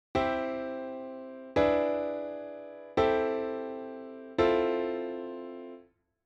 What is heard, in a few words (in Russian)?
cepochka akkordov do mi lya fa